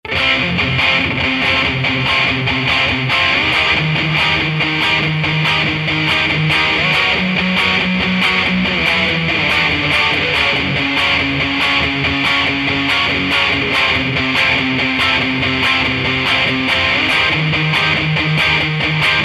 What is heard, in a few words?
Metal Verseion; ff6